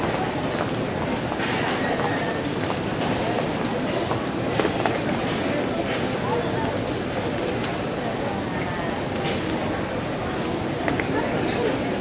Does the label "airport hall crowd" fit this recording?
Yes